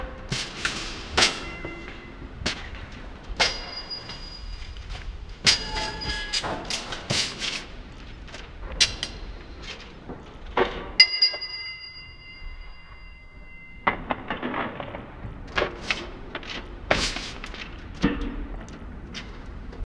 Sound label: pure ambience no music, mining